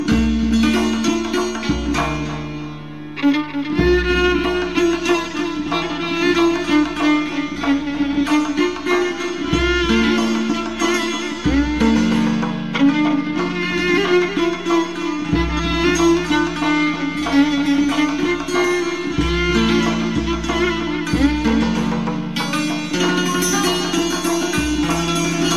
Violon

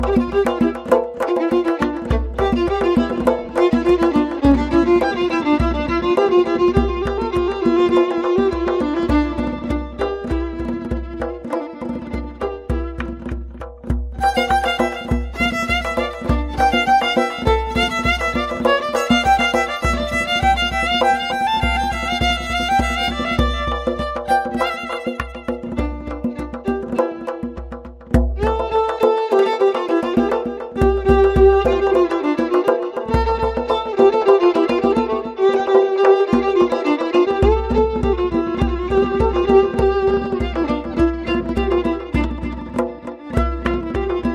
Violin

